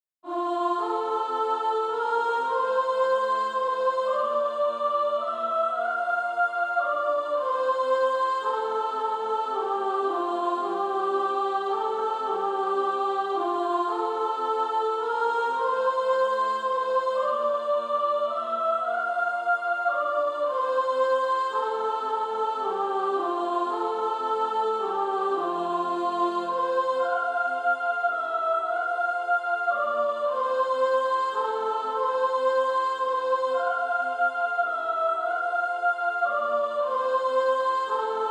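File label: Female Choir, soprano 1; Sweet Hour of Prayer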